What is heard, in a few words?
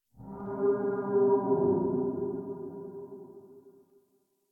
Exterior Distant Calls Scared; Ambience; Duviri; Warframe